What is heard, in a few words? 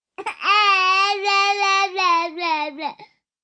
Baby Blahblah, Baby fussing, 1 yr, crying, babbling, complaining, human voice, kid, child; Talking Sound Effects; Sound Effects:Voice; VoiceBox